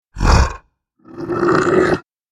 Bear growling and snarling; animal

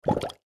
Drinking potion

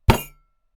Linear steel table hit impact plates glasses

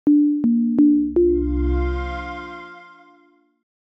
Video game reward chest opening sci-fi

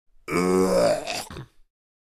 Middle age male throw up

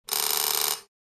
Common electric doorbell

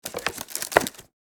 Wicker basket lid lift and open

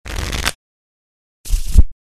Deck of playing cards riffle shuffle

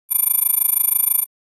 Futuristic data

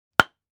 Hammer hitting wood panel single hit

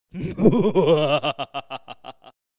Middle aged man laugh sinister

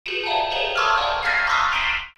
Old vintage cartoon dizzy xylophone

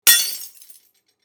Wine glass smash breaking impact

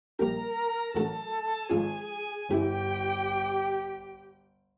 Game over you lose fail comedy

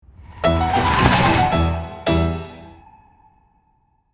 Halloween playful transition cartoon; festivities

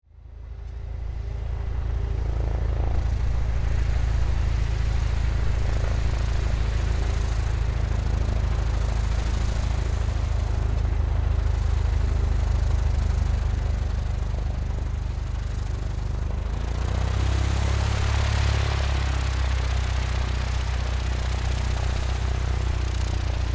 Aircraft flying overhead engine noises

